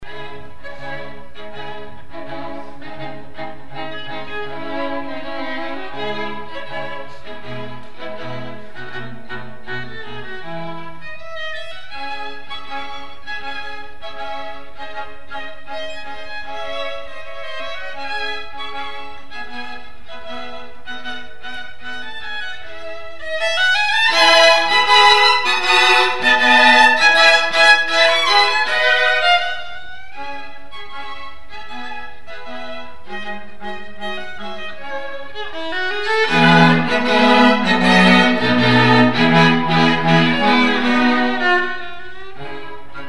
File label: String Quartet First Violin; classical violin; Mozart